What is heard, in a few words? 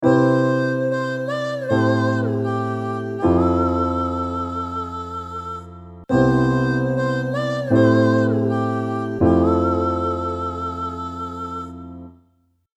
Pop vocal chords